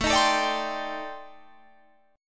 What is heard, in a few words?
C Minor 6th Add 9th A